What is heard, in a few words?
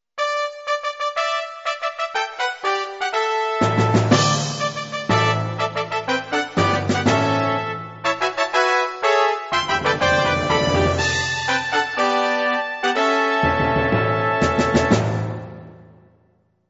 fanfare